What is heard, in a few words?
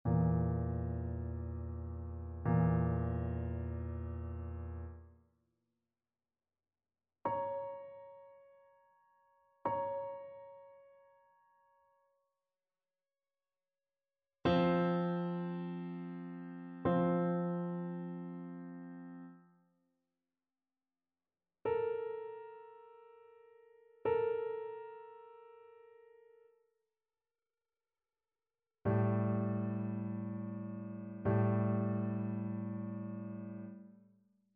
intervals